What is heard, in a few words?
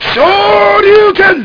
fight